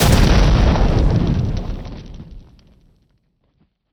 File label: Explosion Classic; meteorgame